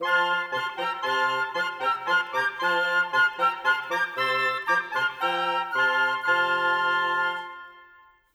Winds, Orchestral; Sonic Foundry (Sony Creative Software); Orchestral VOL-2; Modern 31

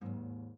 GUITAR FX; Xsample VOL-2

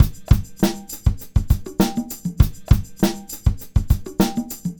Combo, R&B Drums

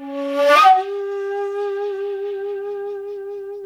shakuhachi gesture; InVision Interactive; Lightware VOL-1; Instruments & Percussions

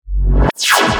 supa chrod

